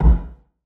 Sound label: Kick